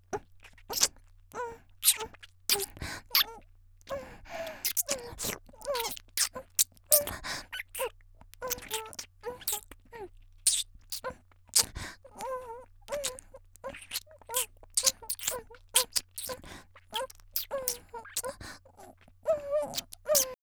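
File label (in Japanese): 少女「キス」激しい; 元気な少女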